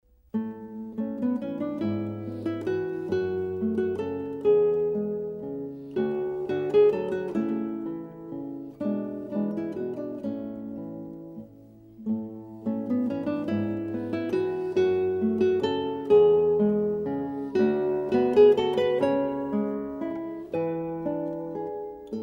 chitarra classica

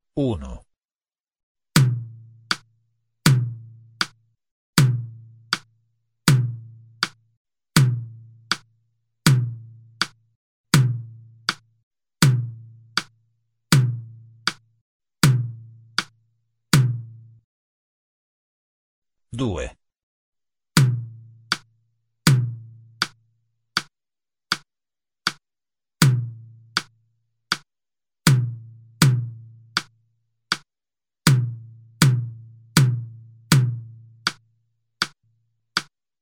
esercizio 2 ritmi; Sonora vol A

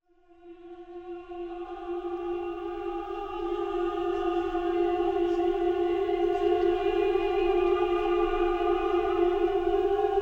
sintetizzatore

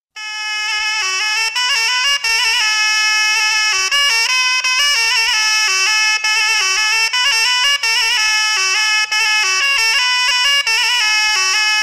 algaita

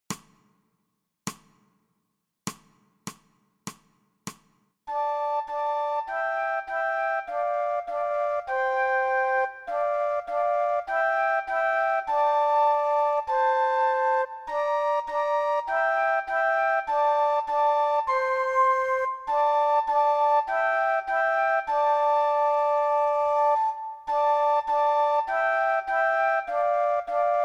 due flauti

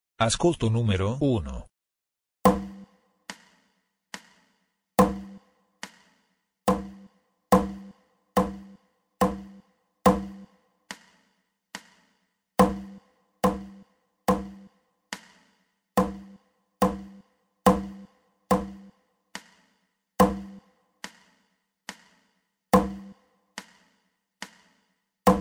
esercizio successioni sonore

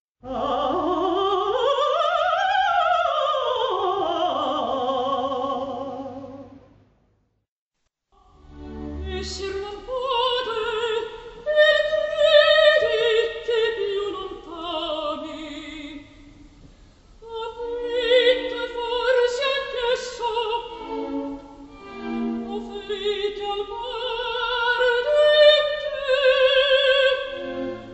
mezzosoprano

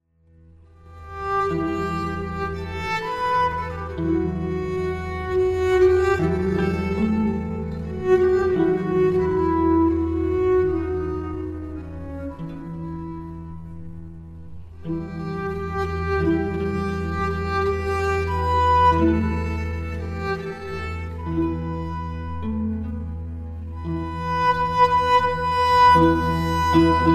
viella; Sonora vol B

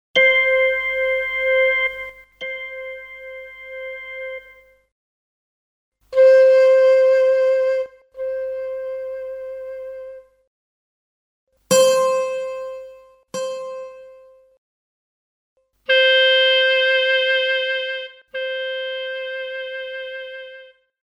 esercizio suoni forti deboli; Sonora vol A

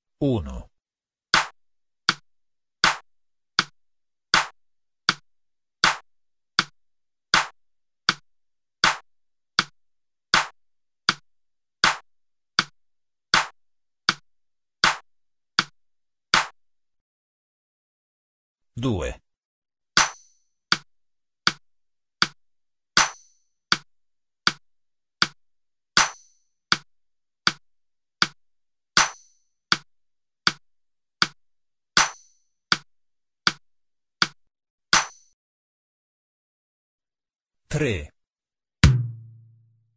ritmi vari; esercizio; Sonora vol A

